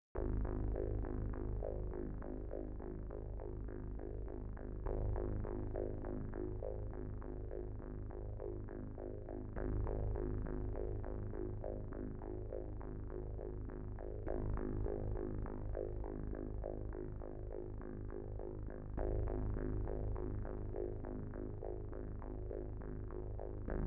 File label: synth; Tron Legacy; Stems